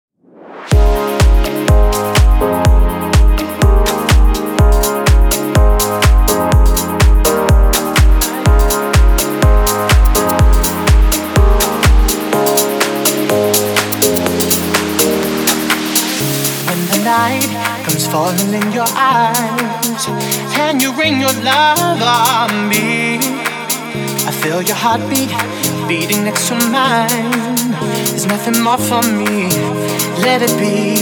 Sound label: grand piano remix